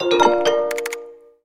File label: mail notification